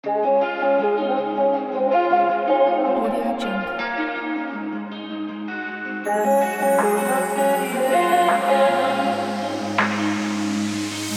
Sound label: emotional trap vocal guitar beat